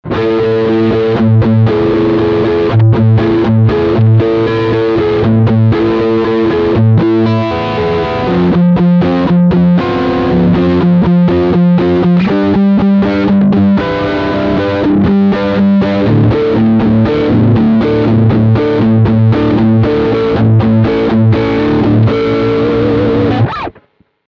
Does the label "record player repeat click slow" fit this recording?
No